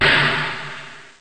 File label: bang; acidosbases